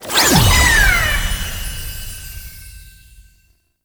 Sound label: Rescue Complete; Warframe; Nokko Colony